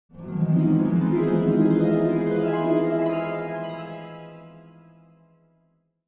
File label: Cartoon dream